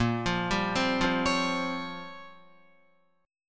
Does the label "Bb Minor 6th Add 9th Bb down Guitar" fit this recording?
Yes